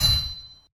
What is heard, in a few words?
hit